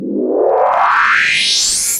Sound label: ascending synth whoosh